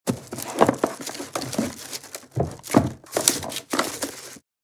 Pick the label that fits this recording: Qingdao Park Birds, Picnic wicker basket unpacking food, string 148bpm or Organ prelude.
Picnic wicker basket unpacking food